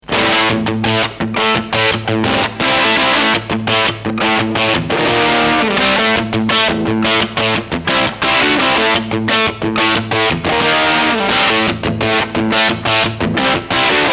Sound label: heavy crunch